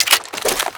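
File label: weapon foley scar reload clip out; Call of Duty Modern Warfare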